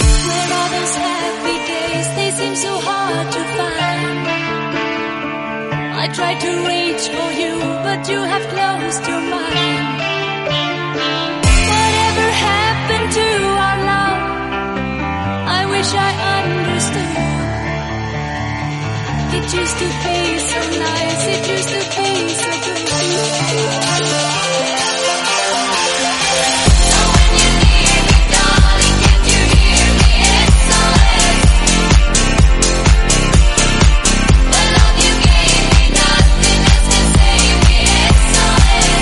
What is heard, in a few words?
126bpm F